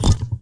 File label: error; BG2; Msstyles; Styles visuels